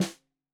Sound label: Snare 65 Reg Stereo, Deeply Sampled Drums